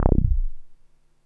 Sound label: NASTY B, BASSES; Masterbits - Vintage Voltage; VV BASSES